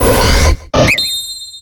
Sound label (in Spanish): Grito de Gallade